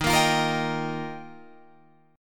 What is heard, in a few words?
Eb Major Add 9th, down Guitar Drop D